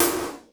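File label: snare drum GATED; Crypton; DrumBase5000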